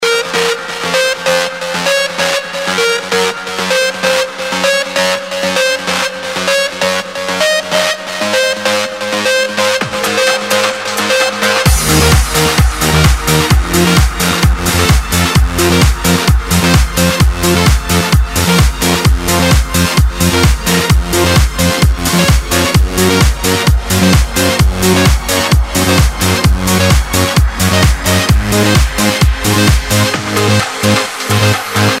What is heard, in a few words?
adagio 4 strings remix